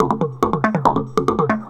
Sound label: Throatbeat; Sonic Foundry (Sony Creative Software)